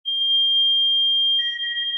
sonar Ping Water Far Beacon; Barotrauma; Real Sonar

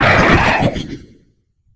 death; Barotrauma; Barotraumatic; HumanImmortal

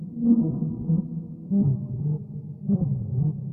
Ice Whale Idle; Barotraumatic

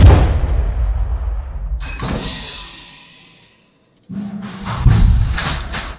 railgun shot sequence; Barotrauma; EK modern

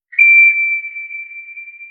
sonar Ping Water Far; Barotrauma; Real Sonar

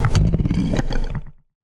burp; Barotraumatic; Crook

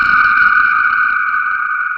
sonar Tail Water Very Close Beacon; Barotrauma; Real Sonar

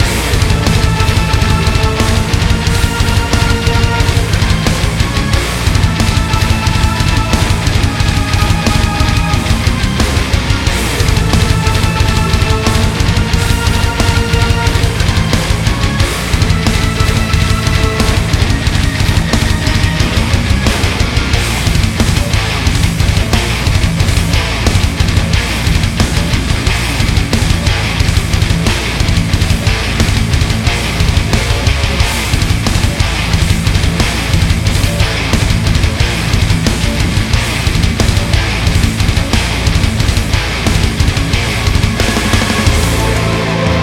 Rock; Barotrauma; DynamicEuropa

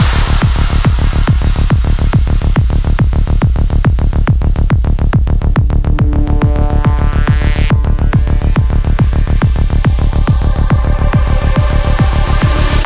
Rave; Barotrauma; DynamicEuropa; Seamoth

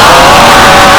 ghost roar; Barotraumatic

